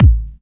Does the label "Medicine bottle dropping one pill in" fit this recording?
No